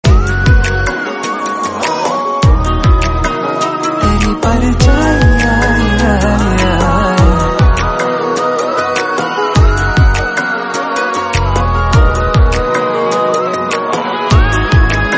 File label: BGM Ringtone